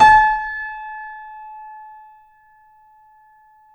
C7 FF, The Ultimate Piano Collection; East West

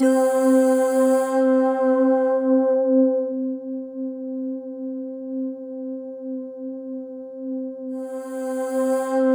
C5, Synth Leads

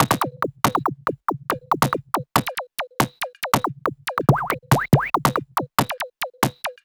Loops 140 BPM Hihat Percussion Loops

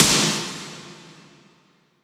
Impacts, Effects; Vengeance; Essential Clubsounds Vol.4

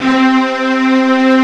MIDI STR, SYNTH STRING; Greytsounds VOL-1

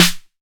808 SD Tape Sat, Snare Drum

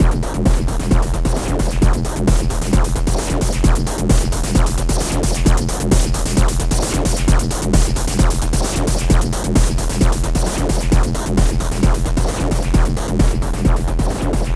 Drums Strange

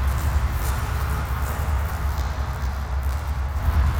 sparse soundscape samples; ReLooped

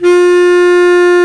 WND BS CLAR, Bs Clarinet, Orchestral Winds; Roland LCDP04 Orchestral Winds; Lo Clarinets

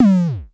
MID TOM, Dual Percussion Synthesiser; Klone

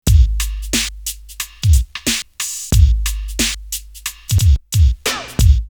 Still Swinging 90, drumloops OldSchool; X-Static Goldmine

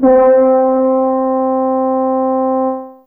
Horn C3, Fairlight CMI IIe Brass; Brass2

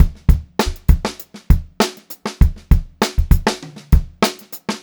Funk-Groove Drumloops

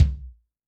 2Hed Med, Kick, Yamaha DTXpress-II

